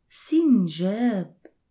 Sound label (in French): ecureuil